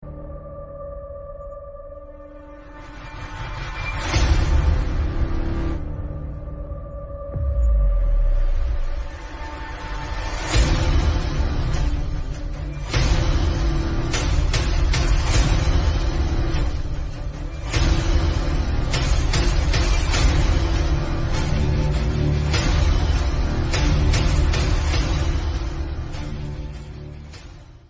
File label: Bgm Ringtone; Pathan Movie